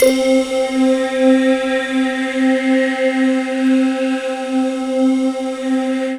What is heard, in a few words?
synth